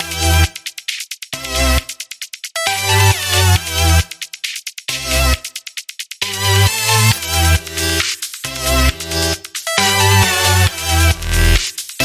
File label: dubstep